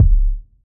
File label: reverb kick drum single shot c sharp key